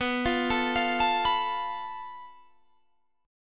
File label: A Suspended 2nd Sharp 5th